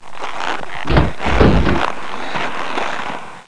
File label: skate; Busy people of hamsterland